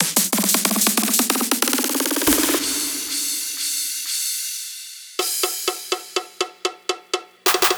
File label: Break and Roll